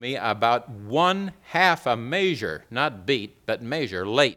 organ

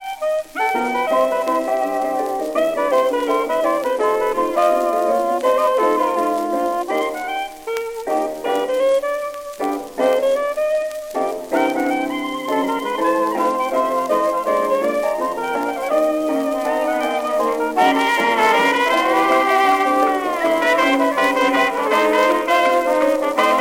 Jazz, blues, jukebox

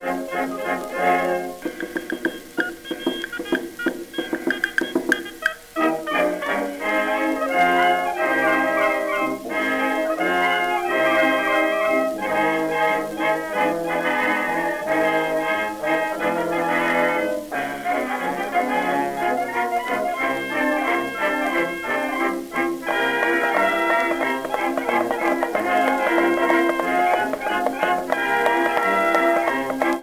Chinese blues, jukebox blues

